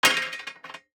DICE on Metal, Throw and Roll, Standard, 2 Two Dice; Samples; ArticulatedSounds; DICE Sfx Party (Extended)